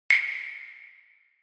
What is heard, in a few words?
Hyoshigi